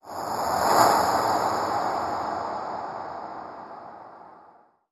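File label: Whoosh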